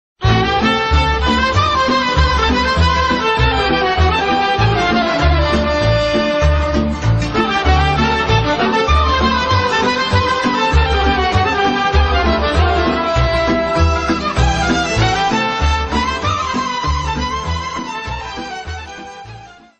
Hora - Roumanie

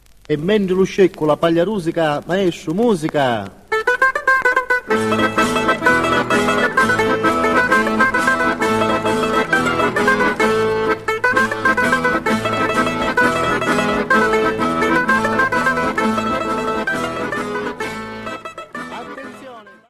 Contradanza - Italie

